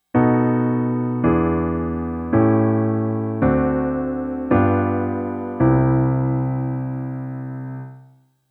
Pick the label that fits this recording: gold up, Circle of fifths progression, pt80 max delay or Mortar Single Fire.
Circle of fifths progression